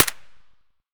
akm silencer distant, weapons; ak103; STALKER; IWP

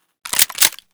m870 pompa, weapons

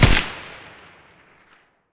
an94 muzzle distant; STALKER; IWP; abakan

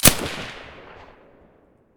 silencer dvl10 stereo

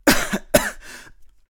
coughing; STALKER; IWP

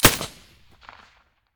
silencer; S.T.A.L.K.E.R.; IWP; vityaz; pp1901

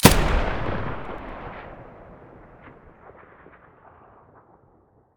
muzzle sa80 stereo

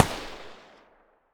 muzzle p99 mono far, weapons

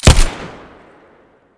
muzzle colt1911 stereo

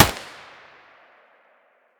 mp5 muzzle mono distant, weapons

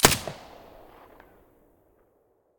mp5 silencer stereo, weapons

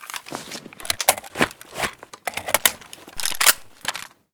svd reload empty, weapons

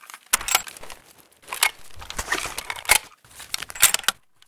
dvl reload empty, weapons; dvl10

